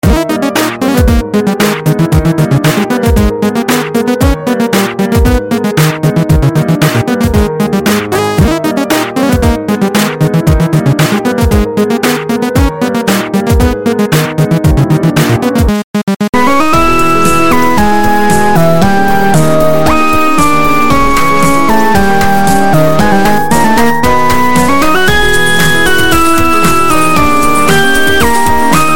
Remix

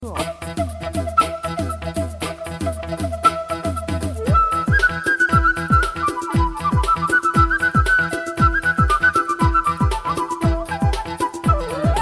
Fast Flute

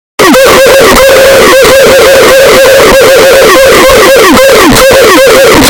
oof earrape

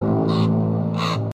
Raven Call

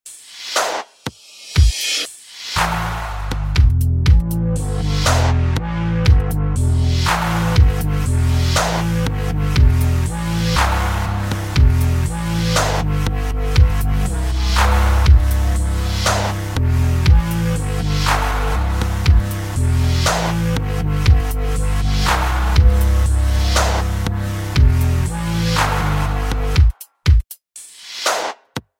Oldskool Ringtone

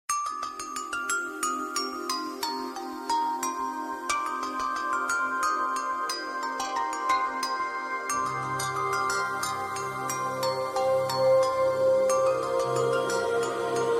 Love tone

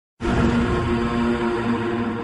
Mech; Falling Skies

